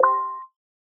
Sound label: alert